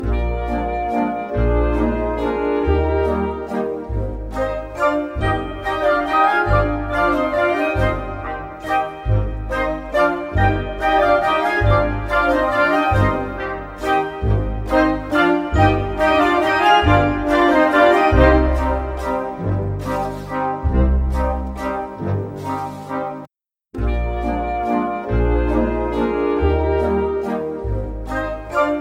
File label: waltz no 2 part D